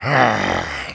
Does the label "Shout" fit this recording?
No